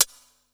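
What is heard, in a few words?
closed hi-hat, Drum & Bass, 160 BPM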